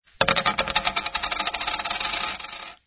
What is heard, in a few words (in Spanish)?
moneda